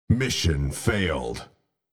mission failed

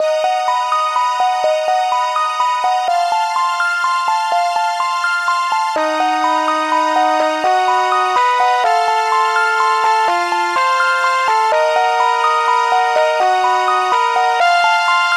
hope jingle eternity

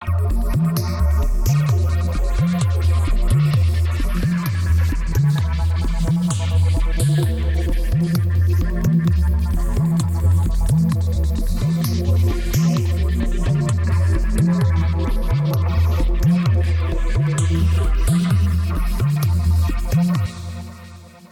jungle scene music; Warplanets

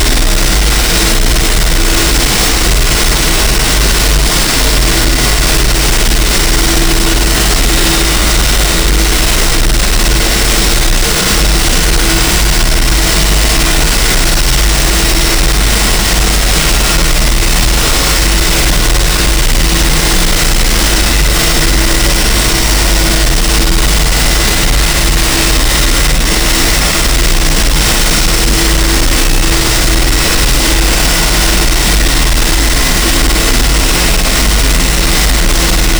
static, frequency static sound effects

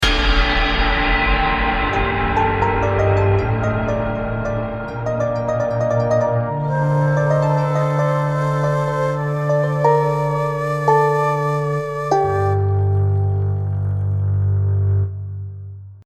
Game Over; Death of a Ninja

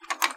light clunk; Mechanical

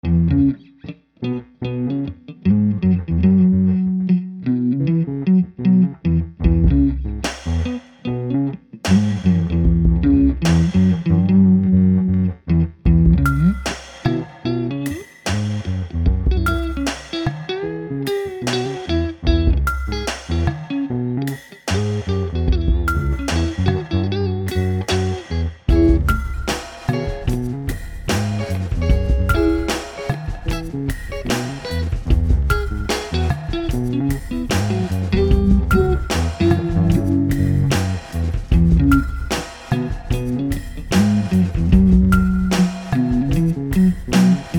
blues

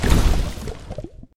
acid burn

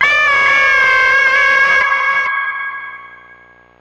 Bird Scream

